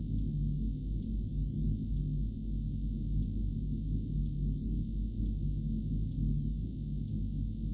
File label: nemesis starbase ion cannon hum; Stellaris